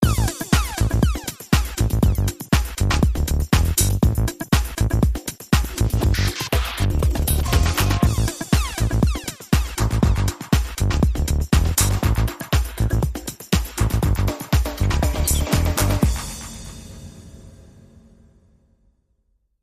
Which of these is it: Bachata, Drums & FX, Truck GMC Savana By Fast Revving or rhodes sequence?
Drums & FX